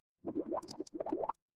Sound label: water bubble